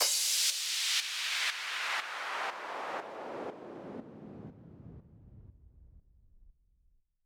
Downlifter; 99Sounds x Monosounds

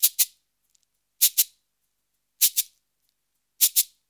Modern Percussion Loops; Soundscan vol.56; AKAI

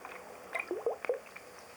Cove Cave Light, Water

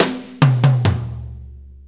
6-8 Fill, Drum Tools

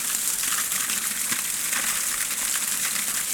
Ice Cave, On The Glacier

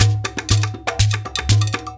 DJMB DNC, Heart of Africa; Spectrasonics; Heart of Africa 2

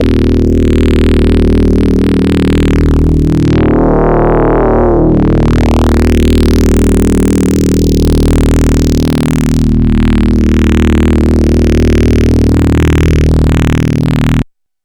bass one-shot; KJ Sawka - Drum n Bass & Beyond

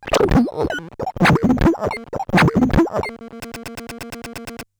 Bent Talking Teacher, Circuit Bent

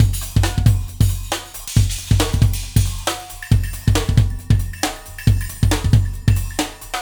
Warp Drive Beat, Processed Drumkits; Sonic Foundry (Sony Creative Software); Processed Drumkits Zero Gravity Beats

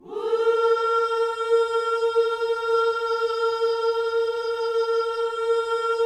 WHOO A#4D, Vocal Planet Gospel; WHOOS; ILIO

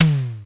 Elec Tom, EMU E-Drum

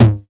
909, DIST; Wizoo - Platinum 24 Electronic; 909 DIST P1

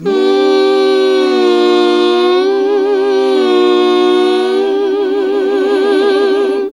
HAL GOSP., GOSP. MMMhh

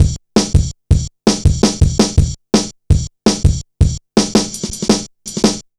heavy ridez, drum loops; Zero-G Jungle Warfare 1, 2 & 3

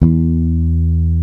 BASS E3, ELEC BASS; East West - Global Sounds

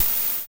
HH, HH Synth

